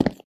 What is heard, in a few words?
footstep